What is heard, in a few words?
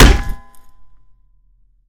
shovel hit; melee; zombiesurvival; weapons